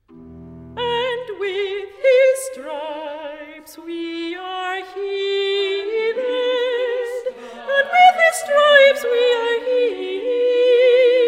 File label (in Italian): soprani; And with his stripes we are healed; Messiah; handel messiah